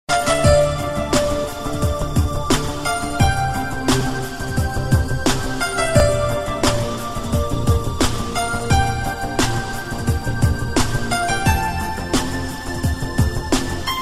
coolest piano ringtone

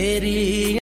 indian song tone ringtone, bollywood

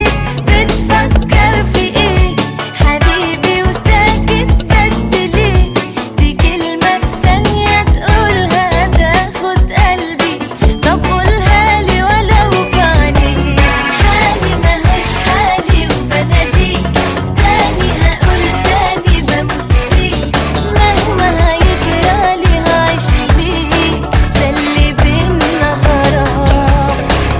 arabic ringtone

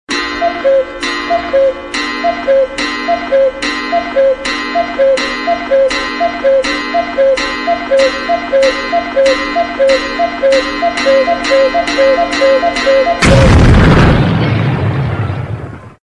cuckoo clock stress ringtone

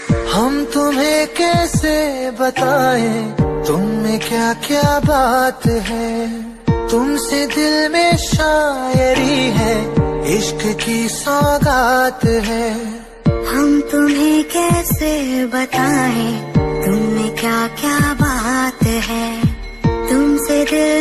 ringtone, bollywood ringtones

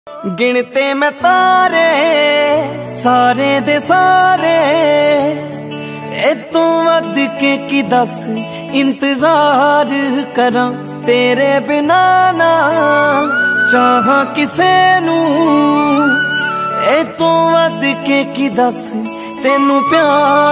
punjabi song ringtone; bollywood

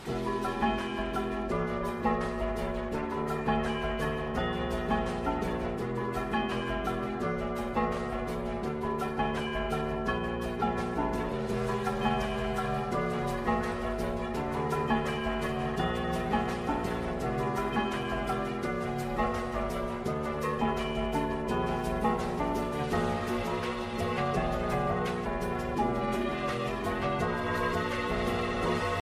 samsung galaxy m20 max ringtone

